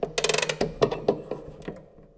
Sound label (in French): MANIVELLE